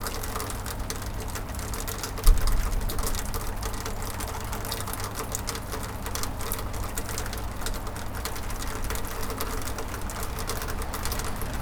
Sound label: rainy morning with pipe; water-drop